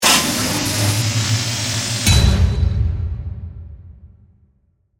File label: Mechanical, Electrical, Crescendo, To, Hit